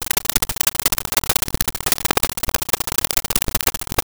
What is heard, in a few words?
Rhythm